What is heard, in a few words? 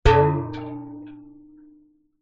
industrial metal canister large containing water hit bang against object